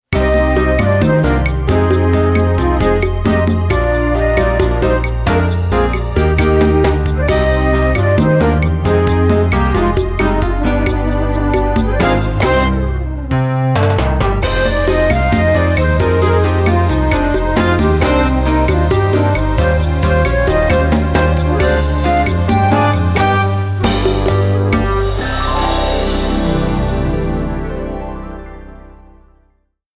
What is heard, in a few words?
Lowrey Bossa